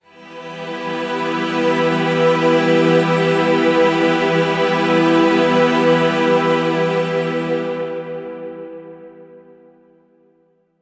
Violin Chord; ps vita; Garamond

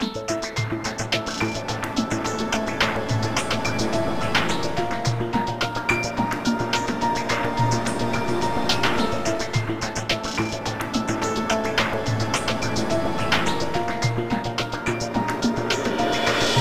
Prison (Ambient); Eternal Legacy; Android; Algoad Prison

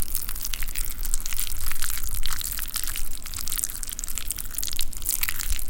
Flash Move Medium

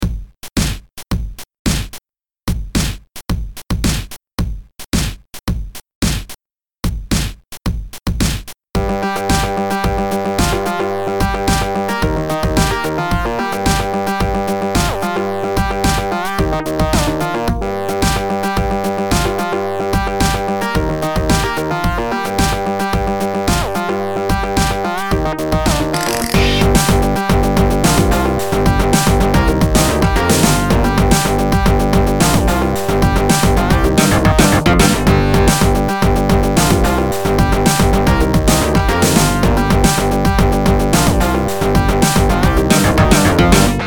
Funky; Duck Game

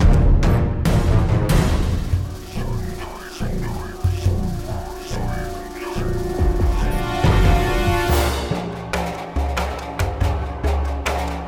Battle Intro; Infinity Blade 3

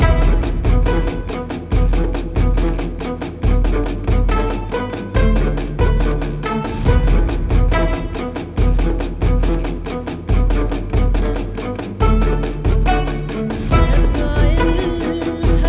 Action 140bpm; project zomboid

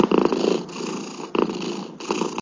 purrsloop; Swipe

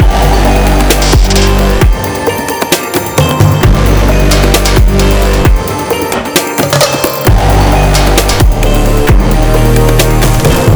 Danger Loop 132bpm

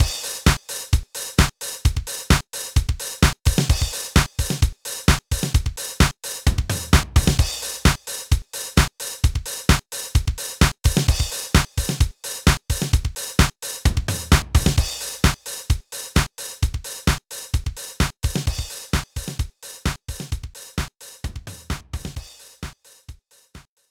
Drum Disco; Brain Challenge

